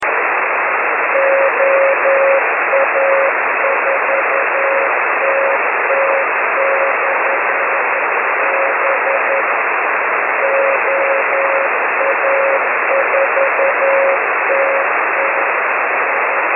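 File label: 6m beacon; OA4TT/b; 6 Meter; N1BUG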